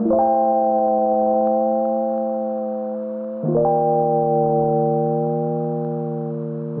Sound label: warbly; Loops; Electronic